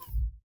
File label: Menu Inspector Window Close; Custom UI Sounds; The Division 1